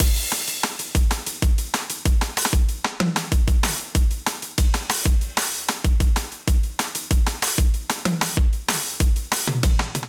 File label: Kit 90 Bpm, Drums; MIDI Clips; Atlas; Ableton; Factory Packs; Vibrations; ModeAudio